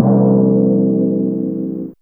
Tymp C3, Fairlight CMI IIe; TremStr

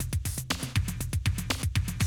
Classic Drum Loops

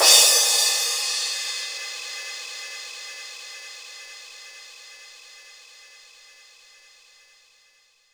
Crash, Roland JV-2080

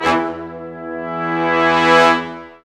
BRS, Lrg Ensemble; Roland L-CD702

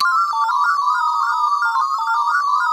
SPACE SNDS; Pro-Rec - Synthsonic; JV FILM

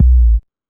Techno A, Drum Machines, EMU XL-7

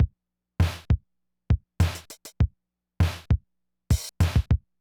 Loop, drumtraks

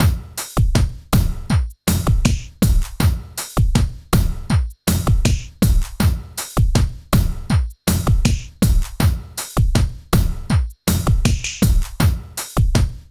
RAGGAE; INIS; Funky Xtreams

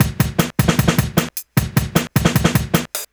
drums, Hardcore Breakbeat, 153 Fmin; Big Fish Audio; Hardcore Breakbeat Action

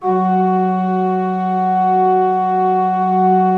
16-8 F3, Cathedral Organ; Propeller Island

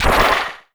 SPLATE HI, PERC; NorthStar - The Wizard VOL-2; TSAR PERC

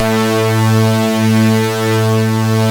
RIPLEAD, Roland Synth Bass, Analog Bass; Roland - Rhythm Section

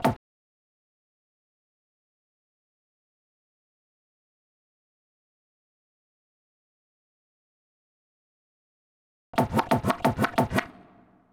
Loafer Scratch, Scratching; Zero-G Smoke

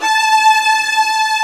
VIOLAS E4; AKAI S-Series CD-ROM Sound Library VOL-2

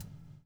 BUBL; Spectrasonics - Backbeat

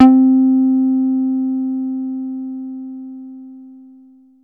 303-Style single hits

